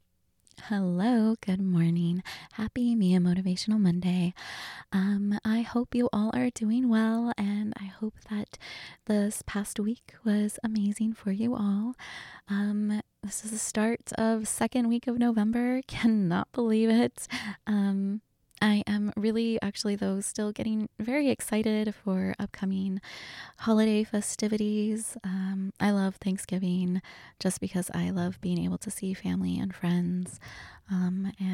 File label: mmm